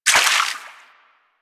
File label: Water Sprayer Surface Impact; Warframe